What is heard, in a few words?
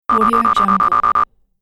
hud multimedia data bleeps